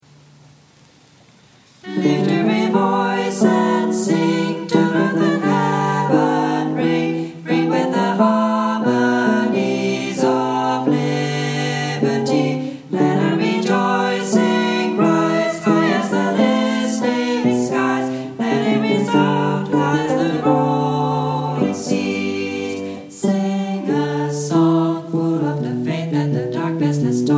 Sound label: Lift Every Voice and Sing, choir